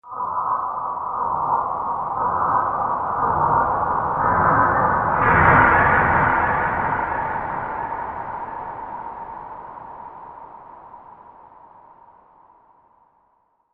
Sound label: Strange Horror Building Tension; Scary Soundscape Sound Effects